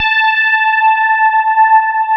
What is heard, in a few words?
SPARKLES; Wizoo - Powered DX